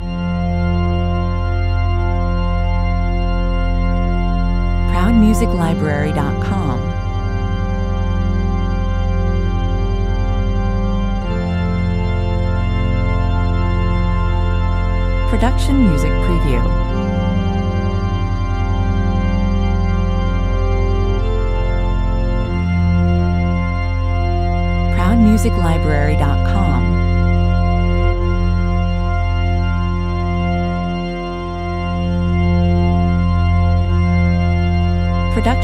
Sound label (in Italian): organo 68 bpm